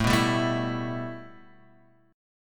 A 7th Suspended 2nd A, Guitar Half Step Down

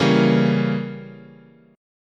Db Diminished 7th, chord

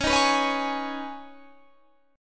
Db 7th Suspended 2nd Db, down, Guitar Standard; JGuitar

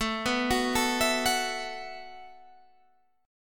B 7th Suspended 4th A

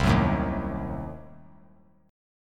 C Diminished 7th down; strummed; JGuitar

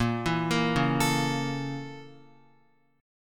Bb Minor Major 7th Flat 5th Bb down Guitar; BbmM7b5; arpeggiated; JGuitar

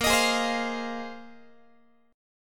A sharp 9th Suspended 4th, Guitar; strummed; x,18,18,18,16,16; chord; JGuitar

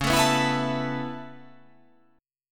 C sharp Minor 7th Sharp 5th C sharp

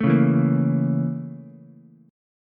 A sharp Minor Major 7th Flat 5th A sharp Ukulele; A#mM7b5 strummed; JGuitar

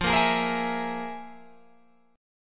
F Minor Major 7th Double Flat 5th, Guitar, Open G

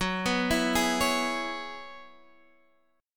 F sharp 9th Suspended 4th, Guitar, Drop D; x,9,9,9,9,9

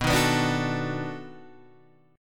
B 7th Sharp 9th, down, Guitar, Open G

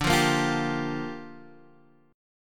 G Minor 7th, D

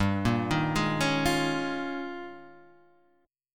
D sharp 11th G

